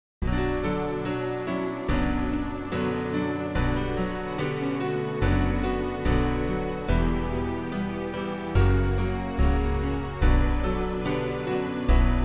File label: instrumental; New Hope Music